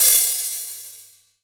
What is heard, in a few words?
HiHat Open Long